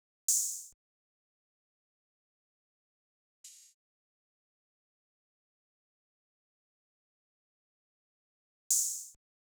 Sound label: Drum Loop - 114 BPM Open Hat, RnB; City Lights; Cymatics; ANALOG EVOLUTION Beta